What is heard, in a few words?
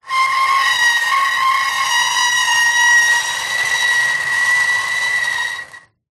plastic small item scrape screech metal; zapsplat